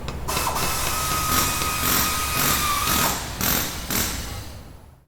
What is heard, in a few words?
repair